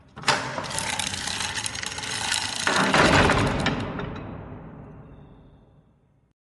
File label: opendoor; WarhammerQuest